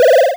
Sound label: sounds powerup